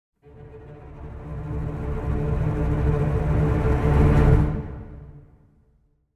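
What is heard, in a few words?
Pre Orch Ens 6 Celli 4 Double Basses 8va Tremolo; Audio Imperia Areia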